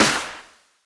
large room clap sample f key, Royality free hand clap tuned to the F note.; single hits one shots